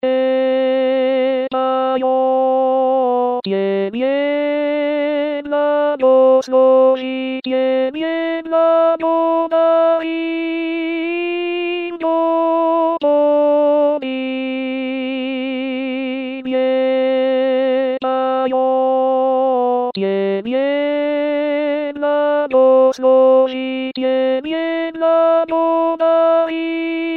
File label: tebe poem alto